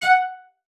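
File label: CELLO spiccato F#5; Xsample VOL-5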